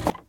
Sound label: wood jump; firefight